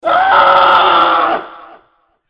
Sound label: scream